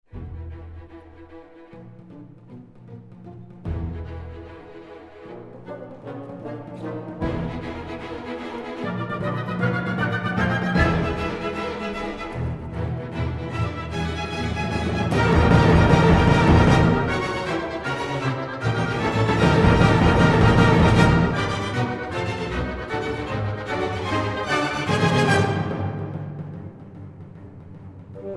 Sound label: Sax Con